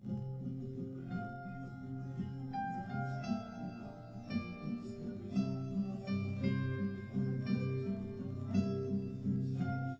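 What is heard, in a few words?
nodrums live recording 250ms 5cm, underdetermined; SiSEC11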